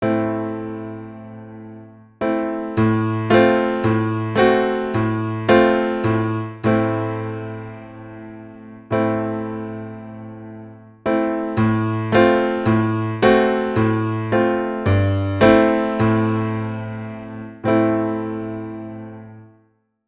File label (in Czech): dvojhlasy piano